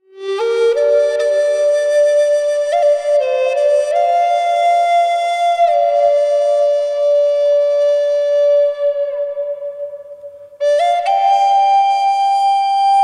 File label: Song G